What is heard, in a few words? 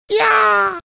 imitating scream